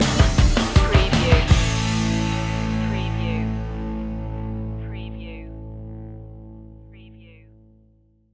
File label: swamp monster stinger